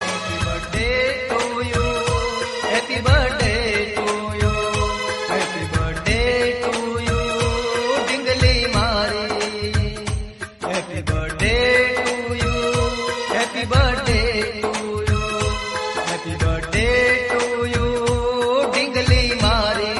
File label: Gujrati Birthday Song